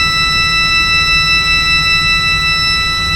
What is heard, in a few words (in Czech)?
scharf petirady, englerovy varhany